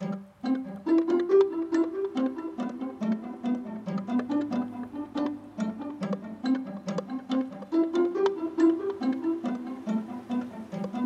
Pluck, 140 BPM C Maj; Stems; Cymatics; Green Fields

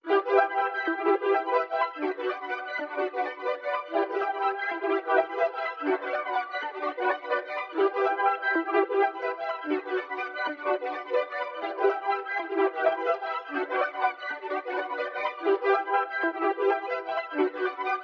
125 BPM B Min Synth; Cymatics; Hide N Seek; 2022 Melody Collection Platinum Expansion

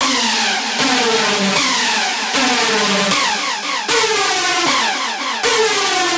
screech loop F# min 155; Music Loops; GhostHack

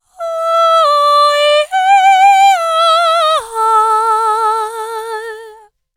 Vocal Lines - Am 70Bpm

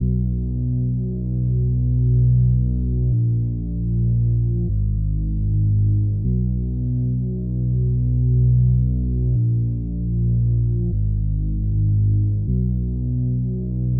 154 BPM B Min Bass, RnB; Melody Loops; Into Pieces; Cymatics; ANALOG EVOLUTION Beta; RnB Collection

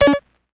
Retro FX; Cymatics; Video Game Sample Pack Vol 1

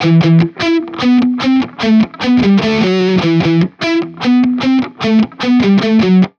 Metal Guitar Loop, 150 BPM E Maj; Cymatics; Vibrations Guitar Sample Pack

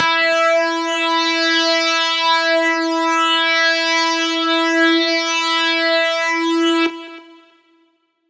Synth Shot E Buzz; Trap and Dubstep; Synth One Shots; Ghosthack; Free Trap and Dubstep Samples 2018

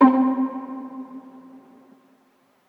Pizzicato One Shot - C; Cymatics; PHARAOH Beta Pack; Pharaoh Premium Drum Samples (BETA)

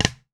Snare Lo-Fi

